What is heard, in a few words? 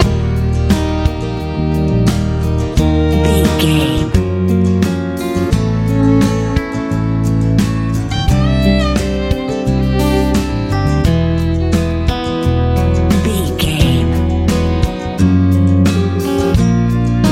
pop rock